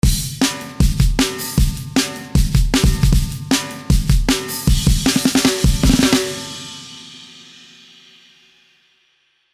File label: aqua drum